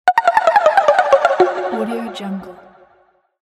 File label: funny game over